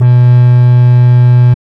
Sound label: TRAX BASS, Hard & Loud Techno; USB Soundscan vol.01; AKAI